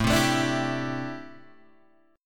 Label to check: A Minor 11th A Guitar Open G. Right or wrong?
Right